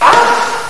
aah

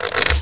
crossbow pull; sci arms

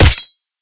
hit, knife; cof; guns

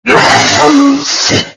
lizardm dead

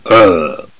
ugh

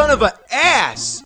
rage; w00tguy; arcade

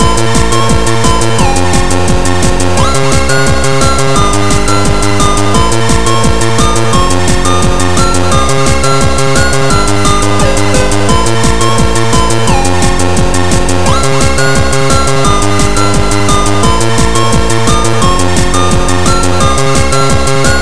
chiptune

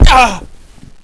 player fall pain

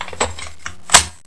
spin; sven

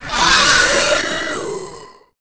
die, licker

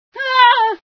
scream, female sci

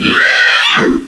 screech

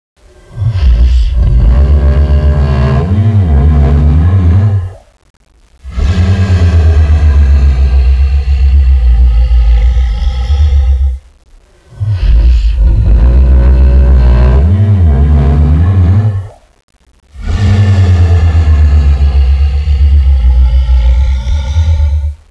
death rattle; tubequeen

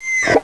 pit drone attack spike; betapitdrone

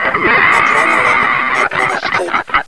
attack, zombie

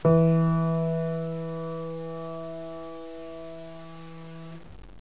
piano e

